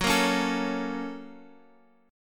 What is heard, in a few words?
A sharp Minor Major 7th Sharp 5th